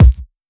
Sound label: Drum Kick